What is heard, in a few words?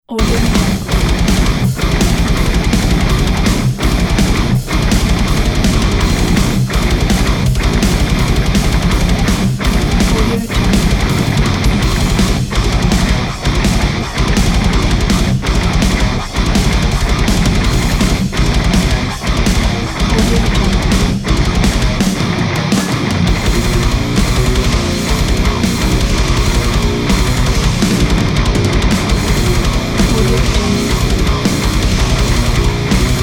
into the mosh